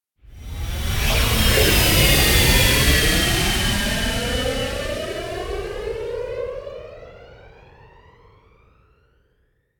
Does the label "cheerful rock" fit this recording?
No